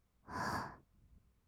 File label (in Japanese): ブレス 吸う音 ふ; ASMR; 1200 kawaii voice!!